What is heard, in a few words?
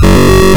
Success